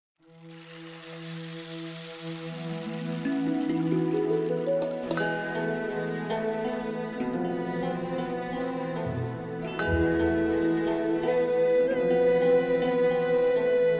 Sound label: Music Stem